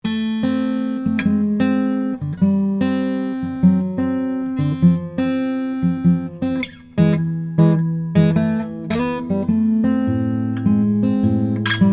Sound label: 2008 schwartz advanced auditorium cocobolo